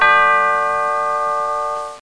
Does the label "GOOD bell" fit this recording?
Yes